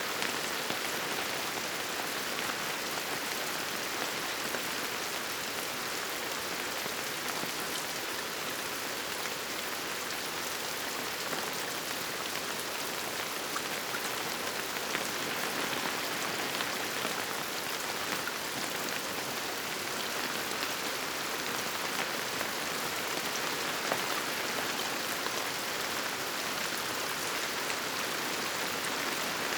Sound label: Rainfall and Powerful Thunder